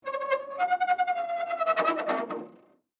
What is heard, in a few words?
Squeak Turn Metal, Pole; Texture; Squeaks (Sound Effects:Objects); BlastWave